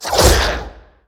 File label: imp warp in